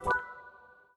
Cube long key, Samsung system; Samsung SCH-M710 T Omnia II

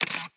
camera click; Huawei Y320 U10

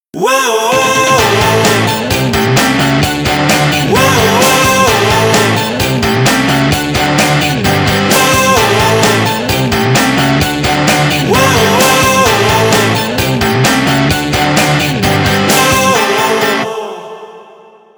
Rock; Sony Ericsson W302; Rock two

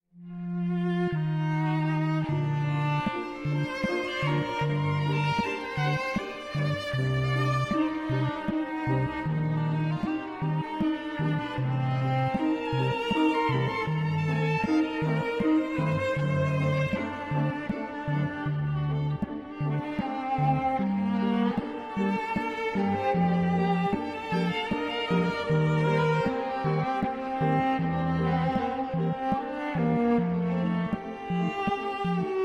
Piano Quartet, ringtones Calm; Samsung Galaxy S24; Schumann

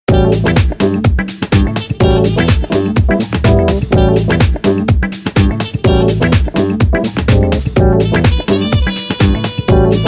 Street musician; Samsung SCH-M710 T Omnia II

